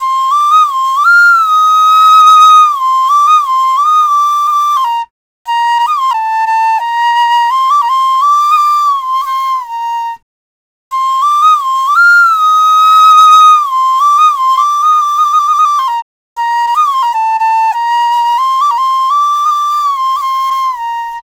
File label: Krisna Flute Loop 88 BPM A